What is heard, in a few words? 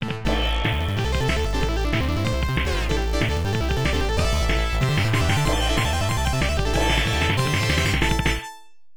Round Clear; Round Clear 3; Raiga Strato Fighter; Tecmo; arcade